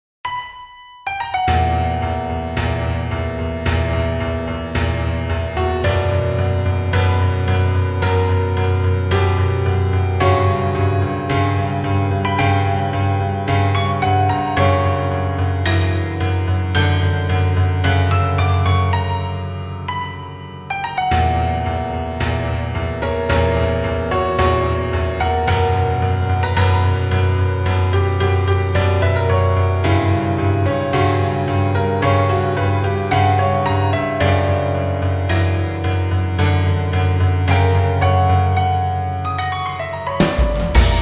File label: piano; The Angry Piano; Cinematic Compositions